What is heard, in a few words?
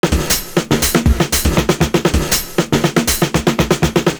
FILL, LOOP; Univers Sons - Kitch 70s